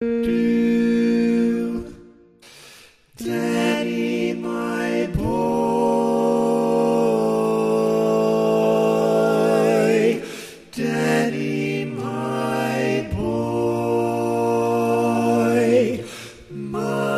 Bari, barbershop tags; Arranger / Bob Bohn

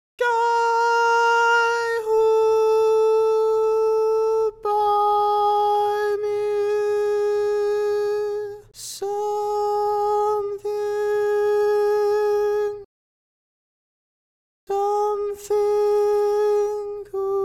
Tenor, Barbershop

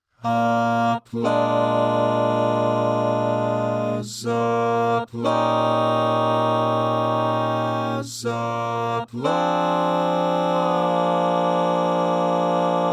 AllParts, barbershop

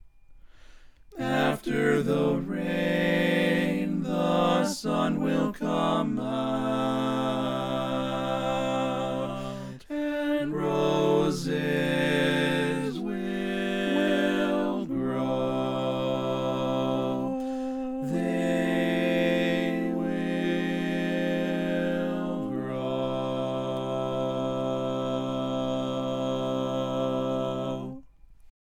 Bari, barbershop tags

